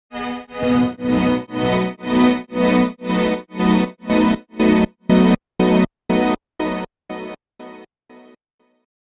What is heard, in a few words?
strings wave